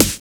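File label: DENIAL snare; Drums In Your FACE!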